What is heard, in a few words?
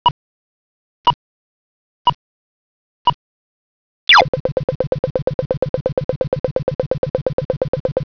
Locator tone alert tone walk indication